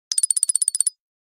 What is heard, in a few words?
Msg Alert; Twitter